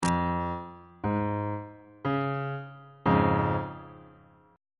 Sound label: c sharp major 1st inversion; bass clef